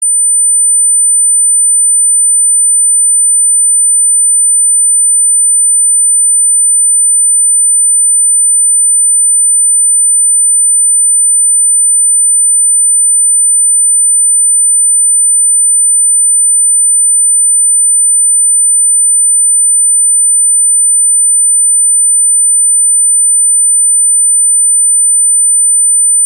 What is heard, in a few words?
High Sound 9000hz